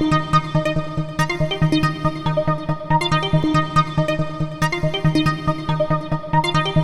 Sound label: Electro, Melody; Techno; TechnoMusicMaker